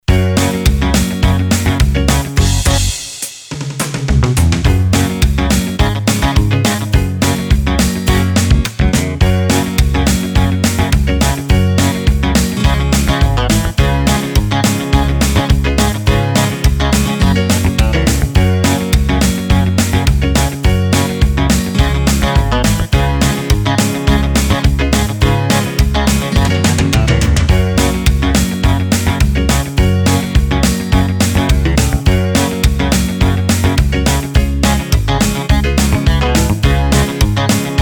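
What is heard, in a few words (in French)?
rhythm solo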